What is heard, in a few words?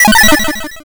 glitch